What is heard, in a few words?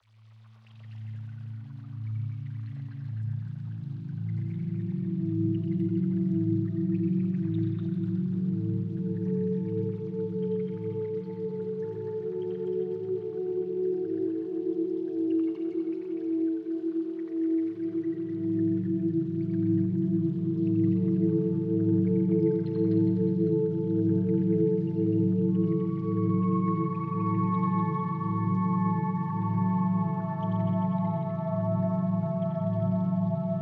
Binaural Beats Brain Waves Isochronic Tones